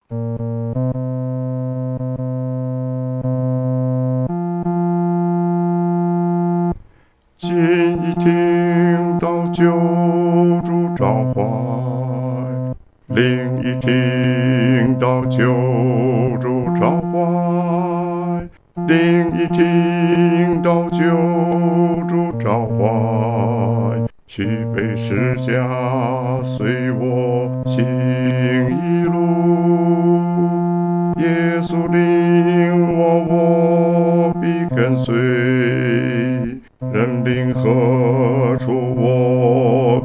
独唱 第四声

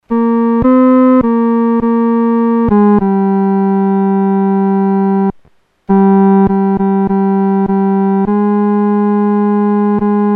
独奏 第三声

